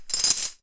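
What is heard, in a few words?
Chain Movement Short; Civ4 warlords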